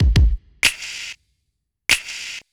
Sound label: Hip Hop Beat, Drums